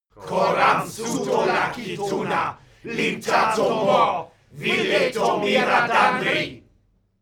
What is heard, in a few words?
male crowd evoke